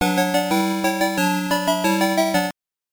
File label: Bonus Stage Failed; Spatter; System 1; arcade